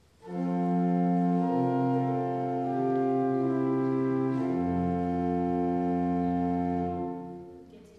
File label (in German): vorhalt